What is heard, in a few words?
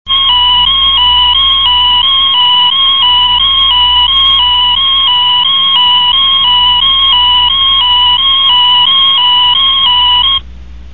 horn, サイレン音; モーターサイレン; 音色 No.2